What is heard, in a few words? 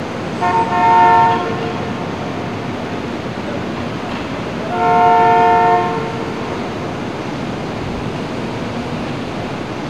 NEW YORK, City Ambience; SFX